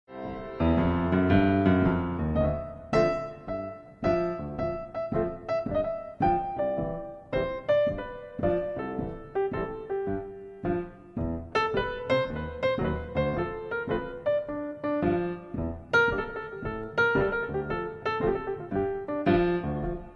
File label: jazz improv